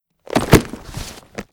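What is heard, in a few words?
weapon foley case bomb drop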